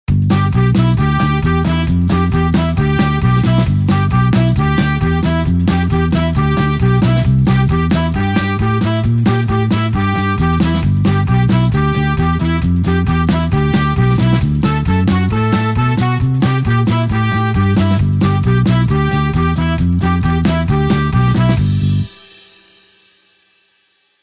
blues horns up G 8beat

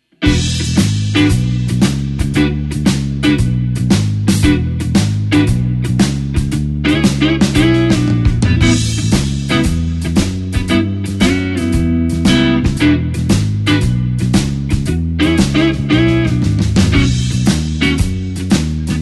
E shuffle chord

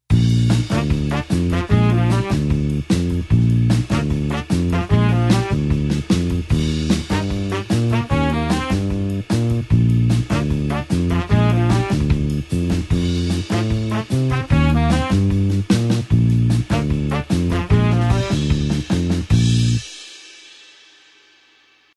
blues horns 8beat Bb tempo

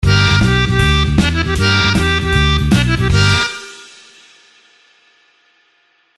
blues harp harm sample

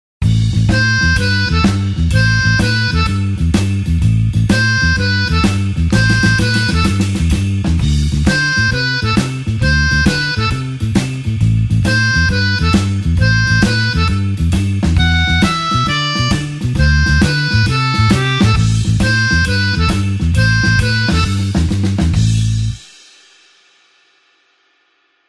blues harp shuffle A

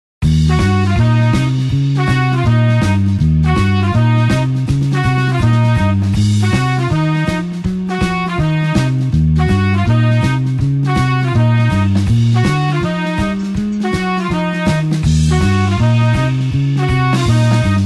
blues horns up Eb

